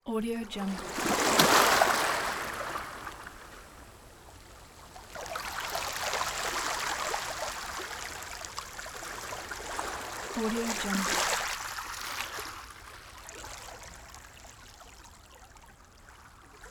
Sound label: beach